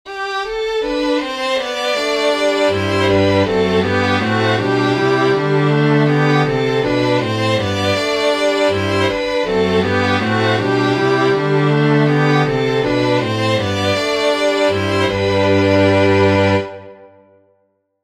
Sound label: canon inversion retrograde